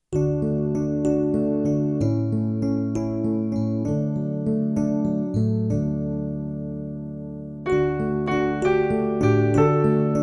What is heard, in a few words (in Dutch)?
kerstmis in de wereld zonder zang